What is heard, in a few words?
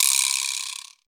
Vibraslap Quijada, percussion; Roland BK-5